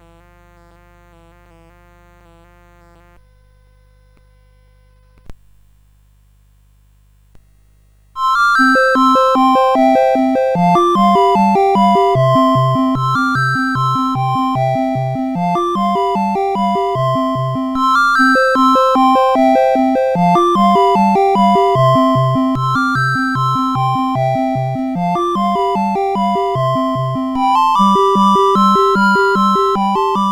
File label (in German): Schwaben-Tanz, CSG8580; CSG8580R5